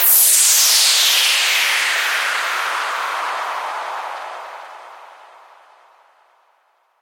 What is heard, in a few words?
wind fx